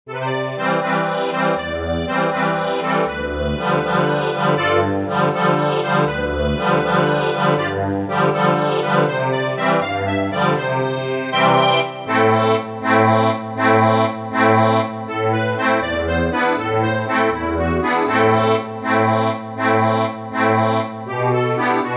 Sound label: accordion